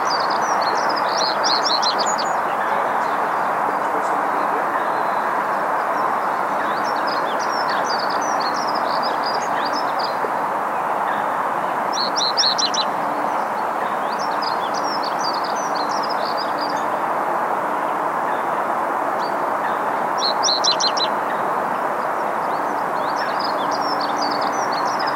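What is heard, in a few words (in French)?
bruant accenteur mouchet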